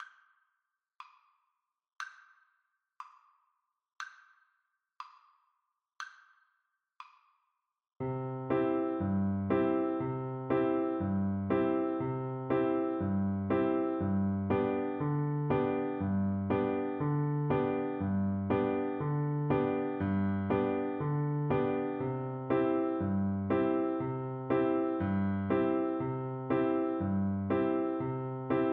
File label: horn, French Horn